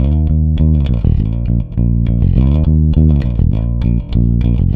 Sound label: Rock, Bass; Bass X